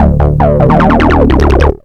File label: bleeps; SoundFactory; FX